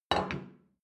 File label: plate place table soft; soundpool